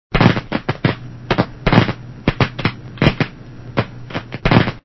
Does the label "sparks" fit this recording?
Yes